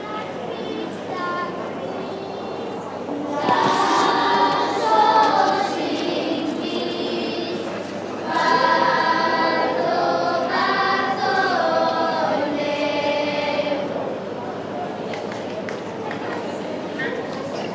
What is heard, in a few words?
primary school georgian harmonies in lanarkshire; thornlie primary school